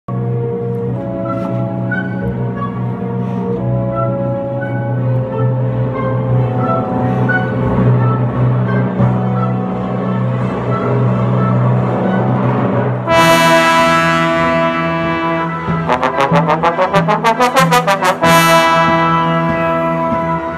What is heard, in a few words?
William tell overture trombone solo